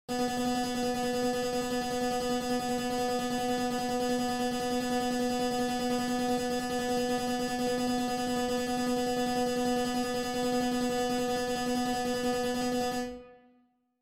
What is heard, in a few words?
repetition comparison A-B; Grimaldi